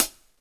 Clean High-Hat E Key, Royality free closed high hat sound tuned to the E note. Loudest frequency: 8068H